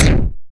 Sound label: Hit 8 Bit